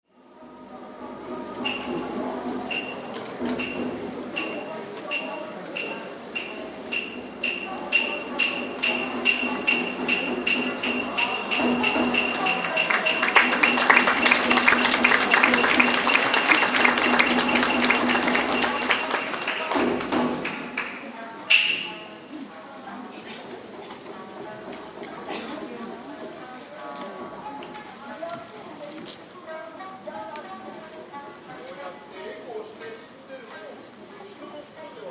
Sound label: Amb Street Musician Music Applause Tokyo Japan; Tokyo, Japan. Japanese street musicians, traditional Japanese